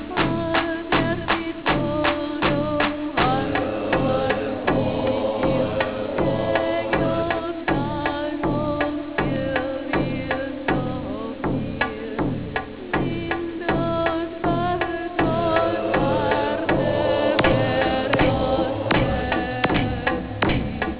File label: loop